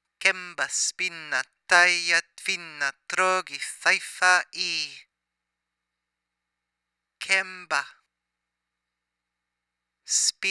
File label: Icelandic Spinning Verse Slow; pagan